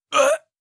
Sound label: damage grunt male